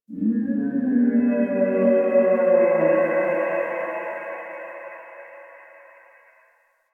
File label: Distant Creature, Ambience; One Offs; Creatures; Warframe; Quests; The New War; Drifter Camp